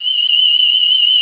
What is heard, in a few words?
TRICORDE